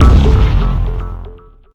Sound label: explode; redeclipse; weapons